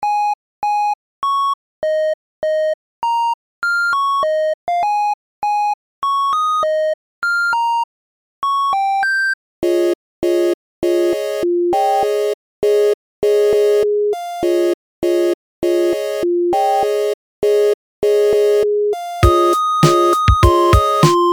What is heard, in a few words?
tree tone